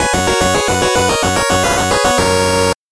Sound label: 8bit jingle slow